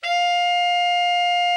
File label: TENOR, Sax; Giga Samples Collection